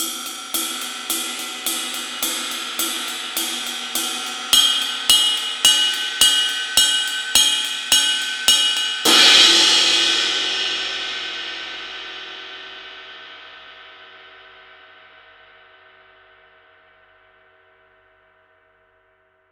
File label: X-Series Ride